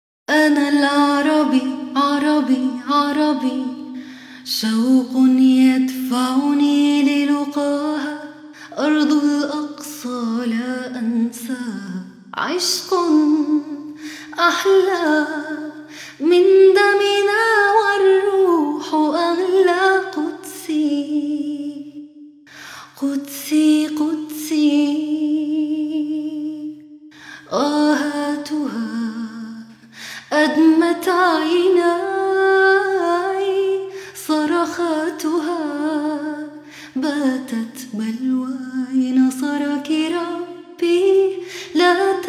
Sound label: woman singing; Gaza